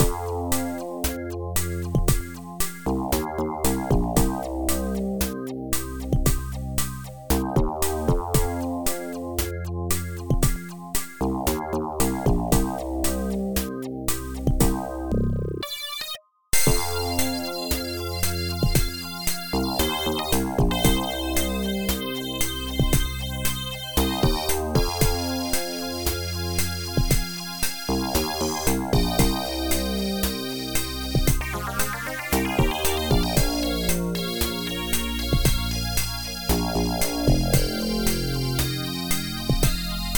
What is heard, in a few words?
Reggae; Night Owl's Shareware NOPV 05 - Night Owl Publisher